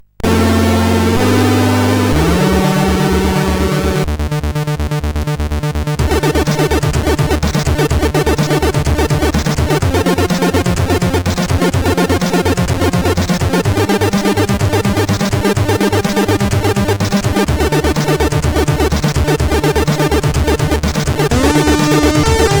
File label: Plasma tune, sid CSG8580R5